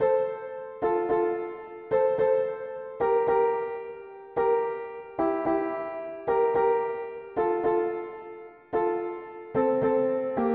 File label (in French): Sous le sang le precieux sang mono piano; hymns